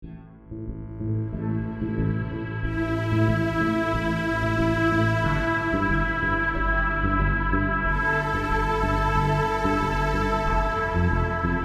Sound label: Electronica Pulse